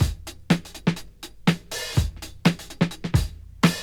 Breakbeats

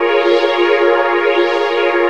Pad, Jungle; Blu Mar Ten; Jungle Jungle - 1989 to 1999 Samplepack

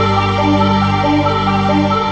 Aura Arp Pad; Jungle; Pads; Blu Mar Ten; Jungle - 1989 to 1999

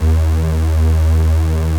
Bass - 5B, Jungle; Blu Mar Ten; Jungle - 1989 to 1999

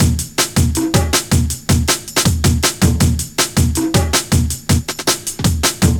Break; Blu Mar Ten; Jungle Jungle - 1989 to 1999 Samplepack

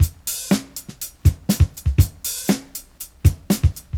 Breakbeats

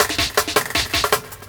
London Break, Jungle; Breaks; Blu Mar Ten - Jungle Jungle - 1989 to 1999 Samplepack

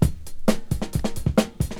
Breakbeats

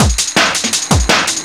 Piper Break; Jungle; Breaks; Blu Mar Ten - Jungle Jungle - 1989 to 1999 Samplepack

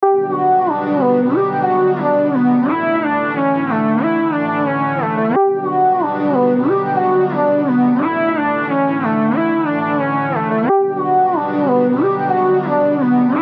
90 D, samples; CRATES & BREAKS VOL.1 - BEEJ

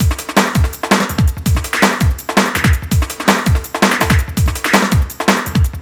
Fairlight Break, 9A, Jungle Breaks; Blu Mar Ten - Jungle Jungle - 1989 to 1999 Samplepack

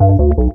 Bass - 10B, Jungle; Blu Mar Ten; Jungle - 1989 to 1999

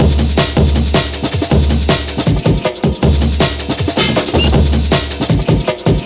Effect Break; Jungle; Breaks; Blu Mar Ten - Jungle Jungle - 1989 to 1999 Samplepack

